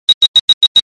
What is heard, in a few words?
message